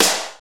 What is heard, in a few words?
SNR, Snares; AMG; Now CD-ROM (Roland); NOW! Drums